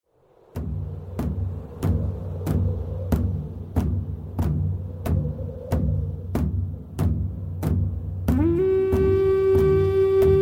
Spirit Flute

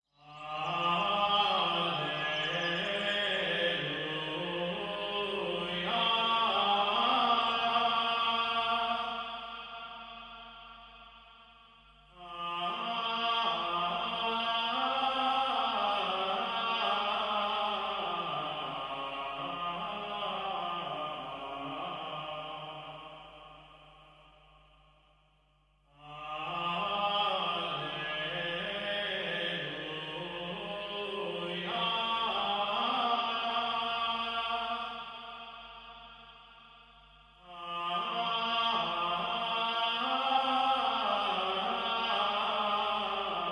Gregorian Devotion

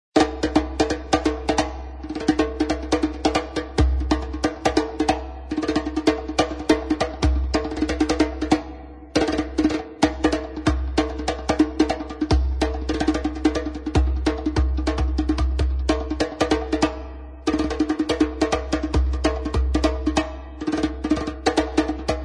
The Healing Drum

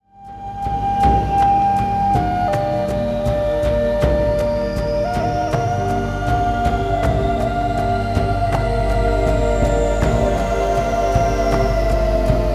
Sacred Drum

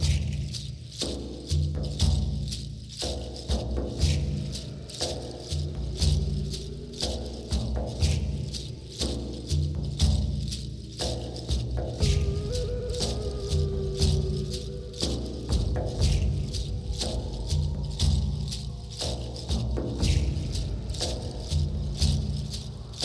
Sacred Mountain Drumming

